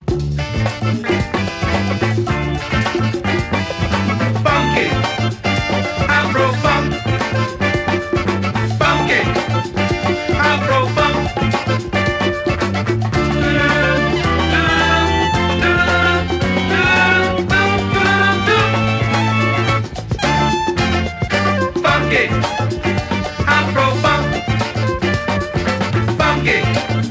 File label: Afro Funk